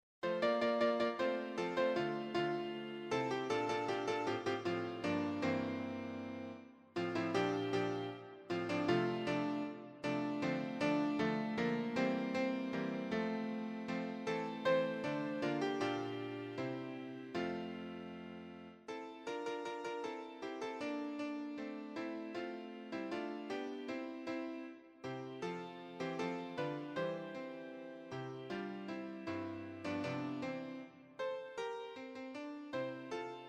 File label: audio sample piano